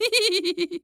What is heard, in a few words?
Human Male Giggle Little Kid Giggle